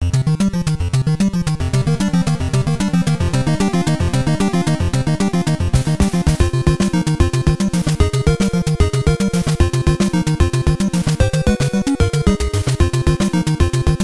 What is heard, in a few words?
Underwater; Teenage Mutant Ninja Turtles; NES